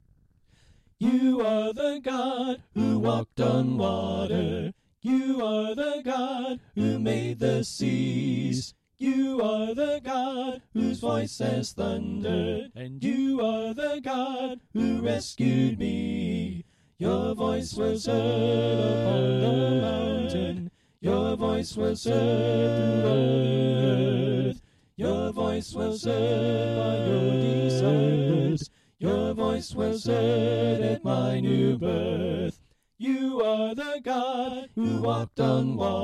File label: You Are The God Soprano Right